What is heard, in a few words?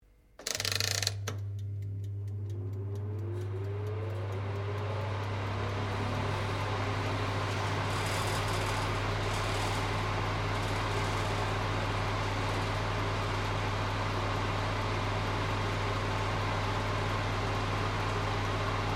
OVEN FANFORCE OPERATE DOOR OPEN SEQUENCE; Common oven sounds; Appliances (Sound Effects:Household)